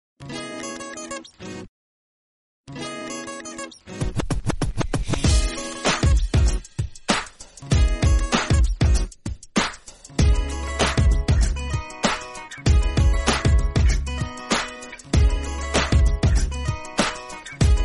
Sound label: Guitar Ringtone